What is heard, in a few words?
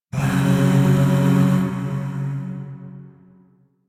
Shawzin Hirajoshi Chord D; Chords; Warframe; Zariman; Void